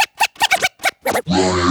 Vocal Scratches 140BPM

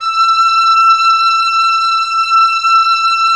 OB, Oboe; Roland - LA Composer vol. 4

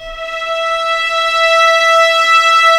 violin E4; AKAI S-Series CD-ROM Sound Library VOL-2

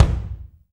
BD, DR BD; Best Service Gigapack 2

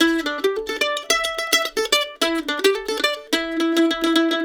LICK, Blues Guitar

